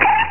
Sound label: Poinkeroo; Realmz 5.1.6